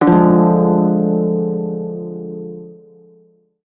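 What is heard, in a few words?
minor chord, shawzin; B; Warframe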